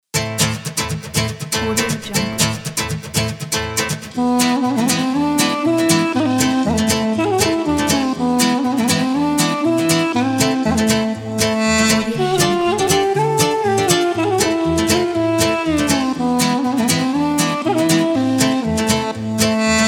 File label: cinematic acoustic folk